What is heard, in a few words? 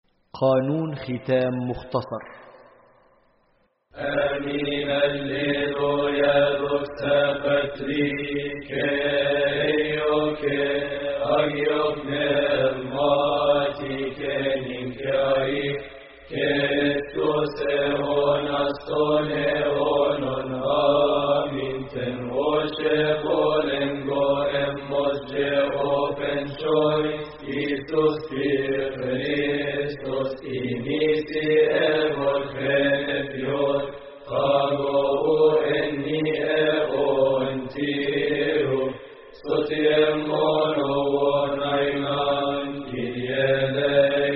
Concluding Canon Minor قانون الختام الصغير, hymns, liturgy